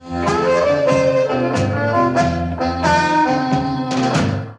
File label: Turnaround, harp